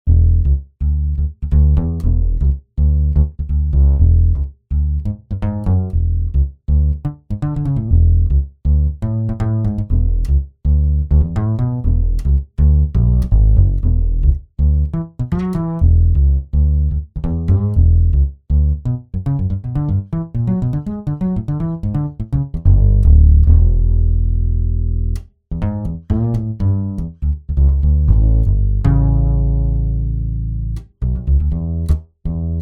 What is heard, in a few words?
Acoustic pickup; Trilogy